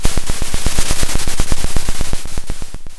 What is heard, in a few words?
pop, Sound of a drum roll